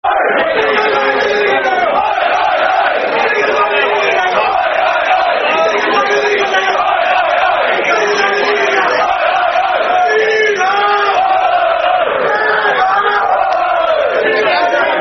When The Blues Go Steaming In, Football Chants (Soccer Song); Chelsea Cfc